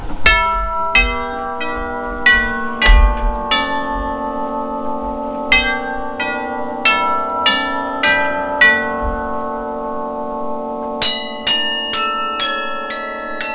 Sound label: chimes